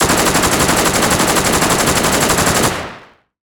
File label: machine gun fire; soundscrate